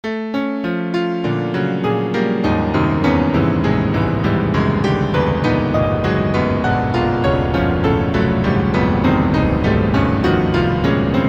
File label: piano